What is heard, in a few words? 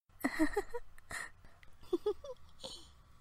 teehee